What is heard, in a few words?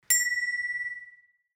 Ring